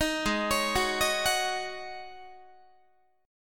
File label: Ab 7th Suspended 4th Ab down Guitar